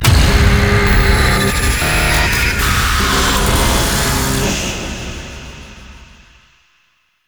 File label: Survival Hack Completed; Entrati